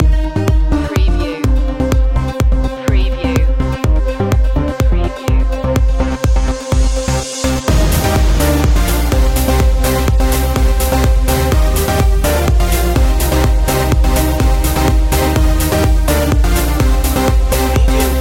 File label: swedish trance